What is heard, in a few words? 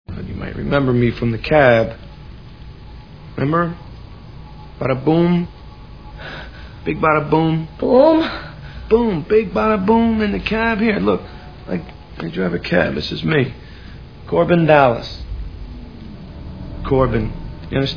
badaboom